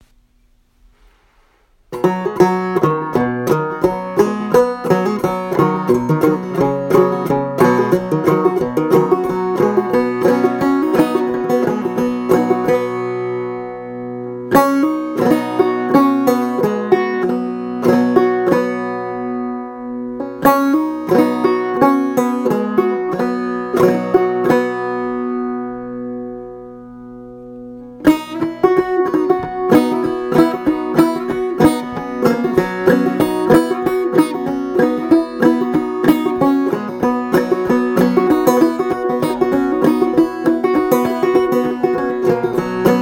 drop thumb, banjo

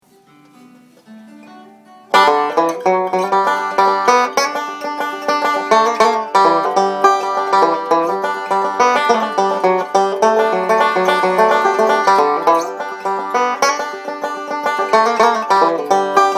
banjo

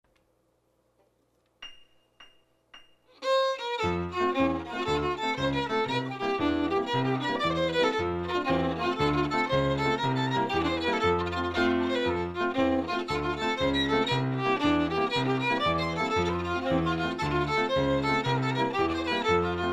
irish, fiddle

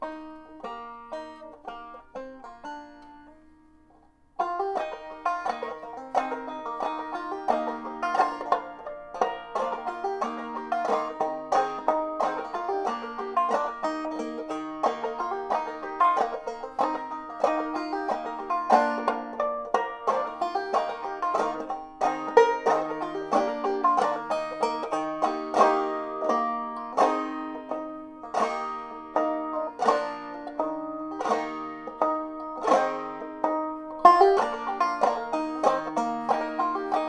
harmonics, banjo

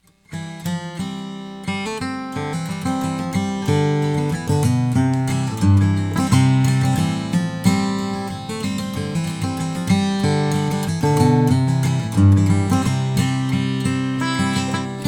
gibson

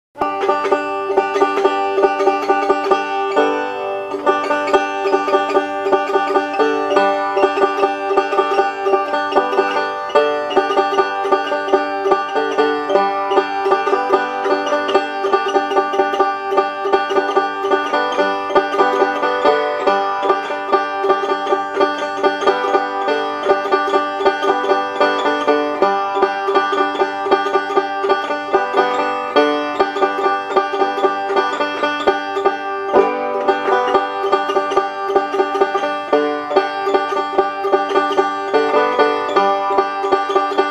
banjo

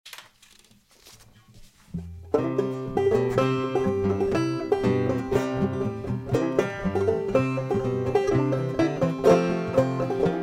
banjo

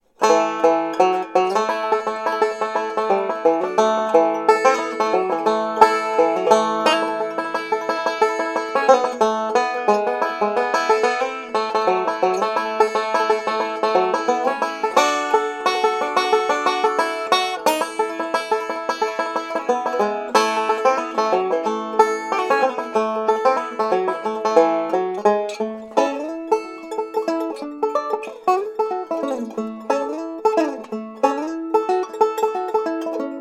banjo test

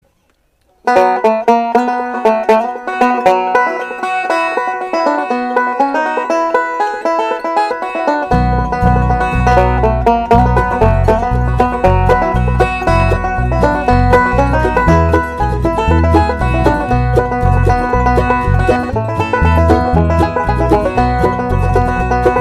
whistle

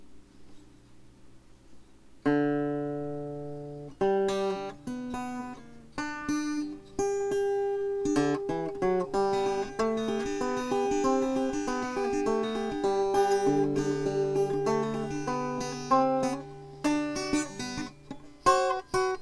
guitar, banjo

